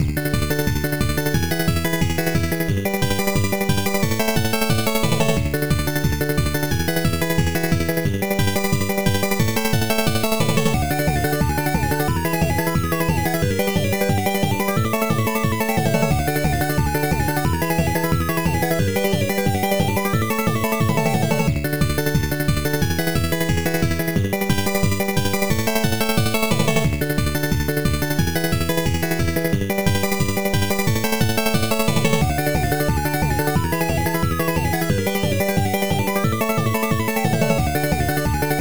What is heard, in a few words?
VS Competition BGM; bomberman 93; turbografx 16; pc engine